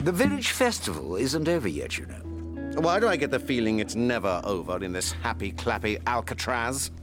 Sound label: happy clappy; Prisoner; BigFinish; The Chimes of Big Ben; Alcatraz